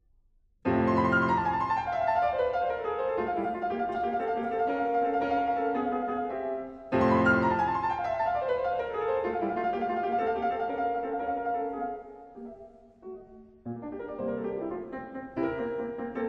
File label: Sonate F-dur; Mozart; KV332